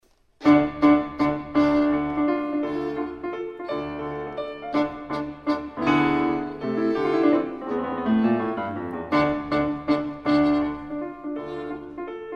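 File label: violin sonata; ahlstrom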